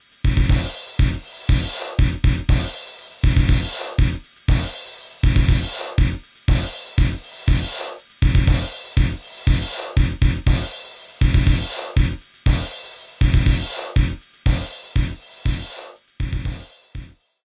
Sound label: hardbeat